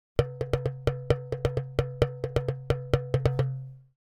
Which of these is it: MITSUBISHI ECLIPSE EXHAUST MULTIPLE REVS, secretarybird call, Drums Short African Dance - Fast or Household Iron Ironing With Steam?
Drums Short African Dance - Fast